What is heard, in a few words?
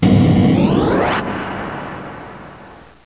Phase